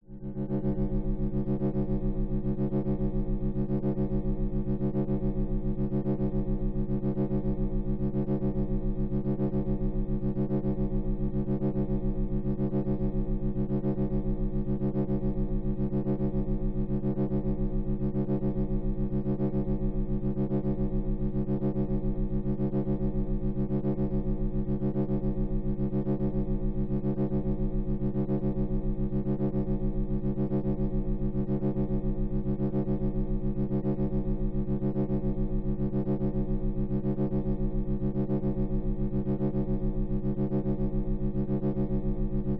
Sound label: science fiction magnetic force field; zapsplat